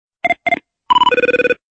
sms zvuk; esche odna sms iz sbornika

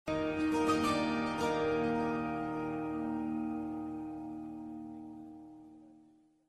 standartnoe sms na mobilnom samsung; sms na telefonah samsung

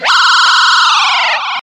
letayuschaya tarelka nlo zvuk korablya nlo

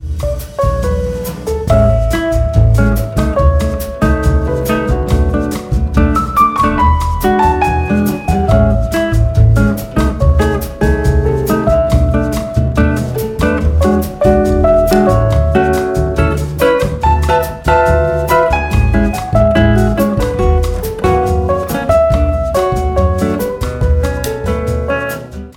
pianino i strunnyy bas

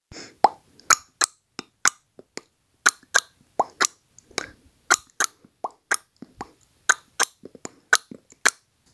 clack melodiya na sms

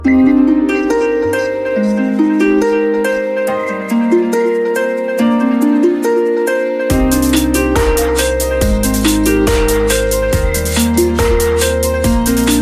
melodiya na iphone 5s standartnyy zvonok

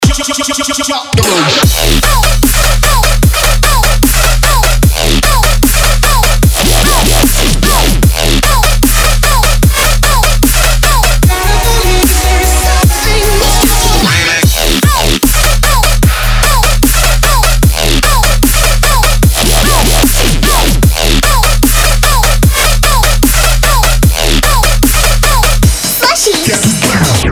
zhirnyy i surovyy dabstepchik

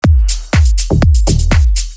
sms stil deep house

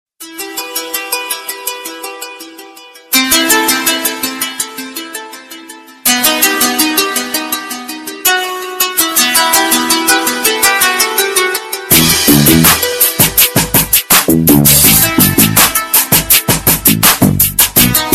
standartnyy rington sms na samsung klassnyy standartnyy 3d

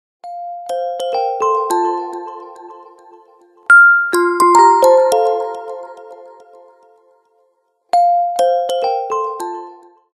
standartnyy zvonok nokia lumia 920 melodiya na telefonah

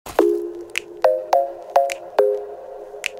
korotkiy zvuk na sms soobschenie

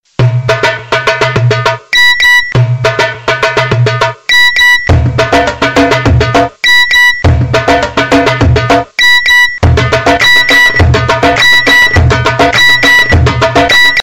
sms mix prikolnaya sms na mobilnyy telefon

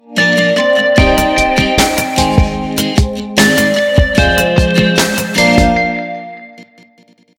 melodiya dlya sms krasivaya melodiya dlya sms

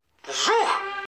vzhuh

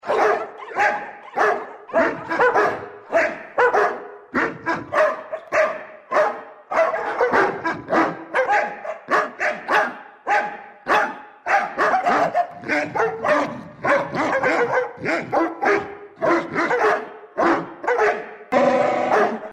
sobaki poyut

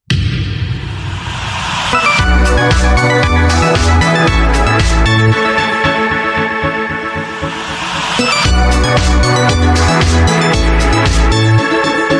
rington sms na nokia klassnaya melodiya sms; novyy rington sms na nokia

